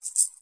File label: rattle, ethnic and world percussion; Rattles